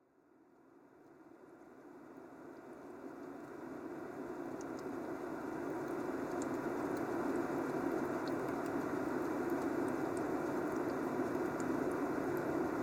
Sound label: relaxing ambient music with white noise